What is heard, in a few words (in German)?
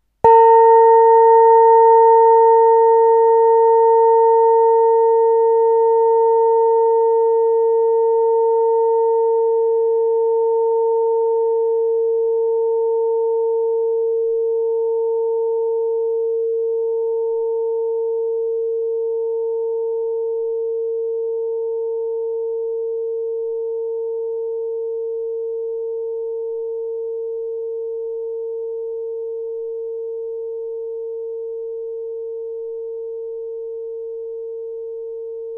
GONG OBLONG; Siderischer Mond